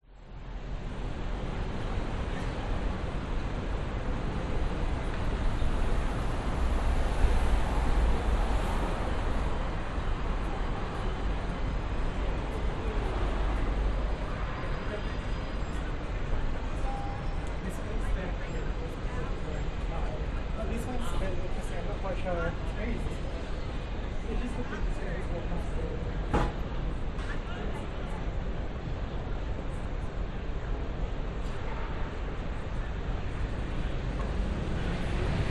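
public places city busy pedestrians close occasional traffic pass brisbane australia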